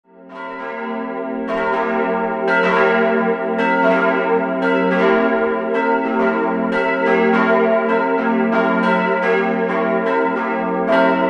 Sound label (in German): Riedenburg, glocken